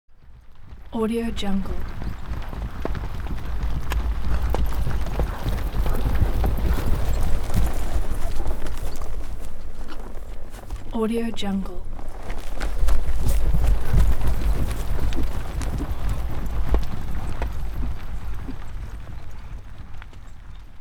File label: ten horses on hard mud and grass